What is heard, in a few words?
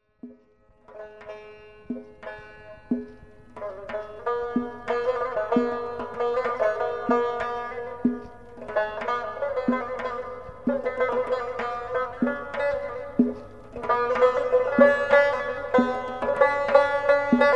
RUBOB, Tong